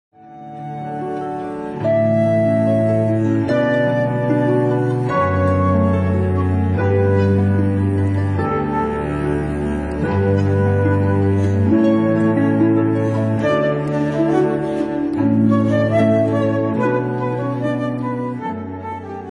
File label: Harp